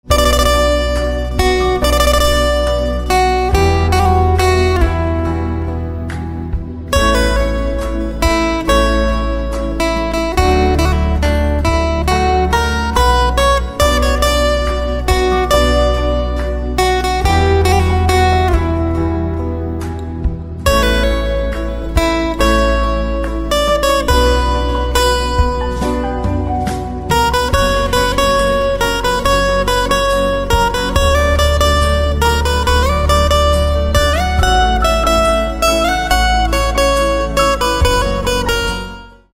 Guitar

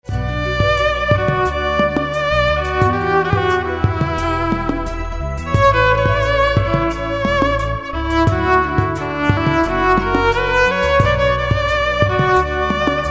Violin

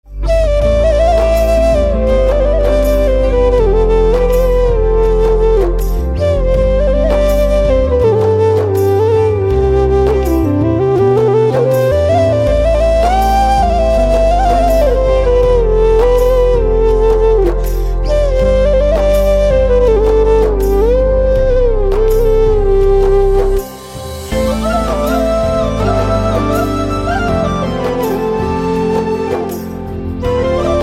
Flute

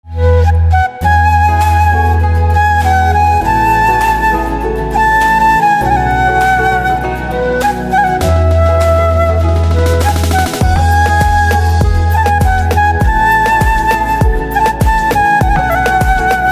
Flute, instrumental ringtones

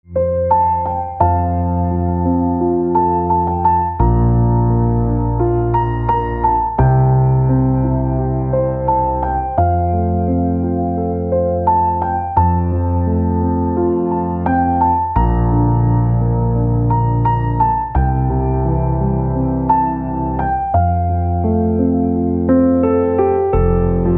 Piano Silent

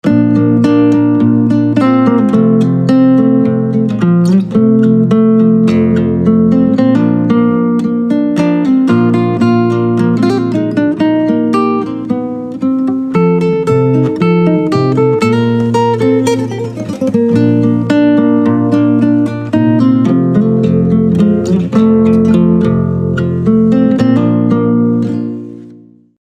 Guitar, instrumental ringtones

